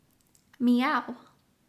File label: meow